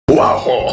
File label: bot funk